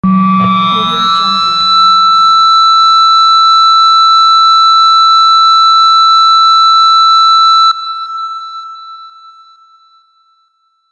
distorted guitar feedback fx

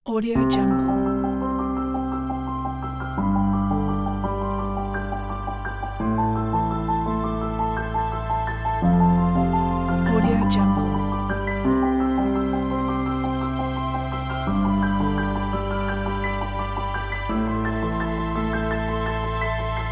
inspirational emotions

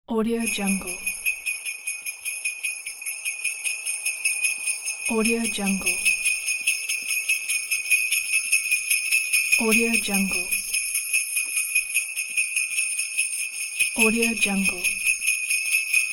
bells christmas; santa coming; mrbruce12

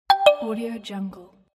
send message

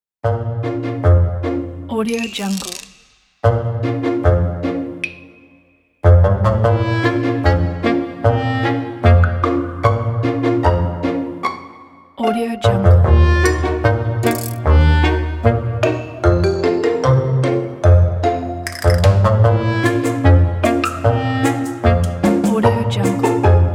silly comedy background; korolkov

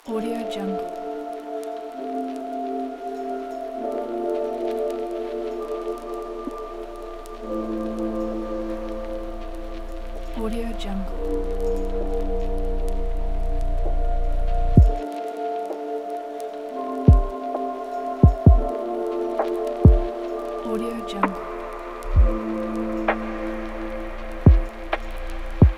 future garage

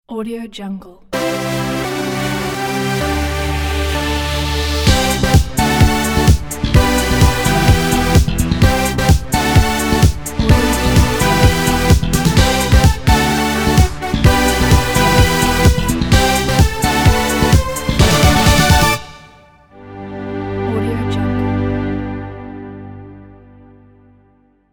corporate electronic logo music

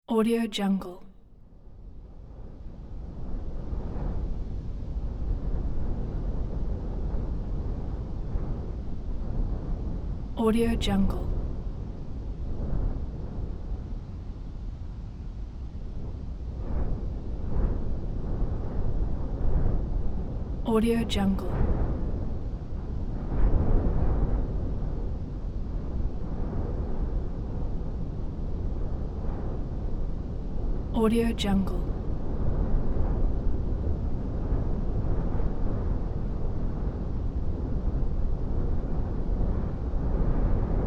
night heavy wind

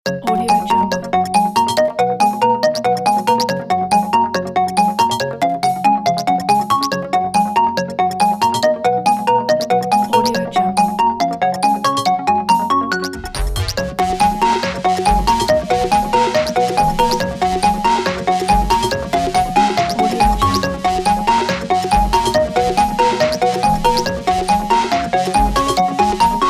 game music

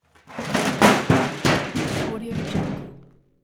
garbage can fall over

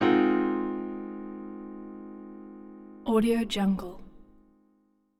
piano chord g sharp

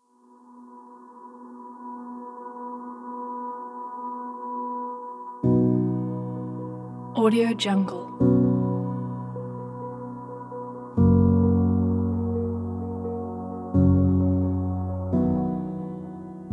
violin and cello; brainalien

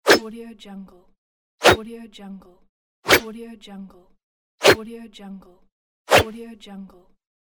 dagger attack; gamechestaudio

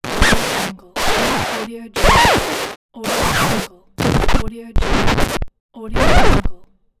record scratch

